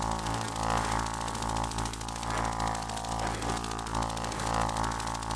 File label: scarab green, monster